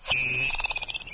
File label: tick pain; doom3